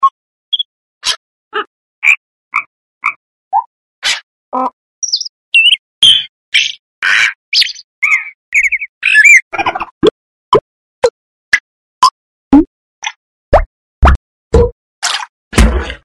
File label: Natural, click sound